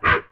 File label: Dog Bark Ten; DogBarks 1.0